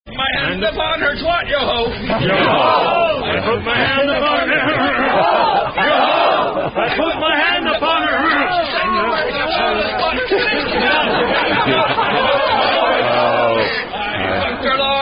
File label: Bawdy Pirate Sea Shanty; Not For Kids; Yo-Ho